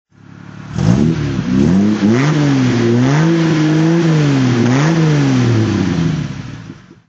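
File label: jaguar xk120 se dhc throttle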